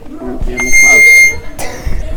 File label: harmonica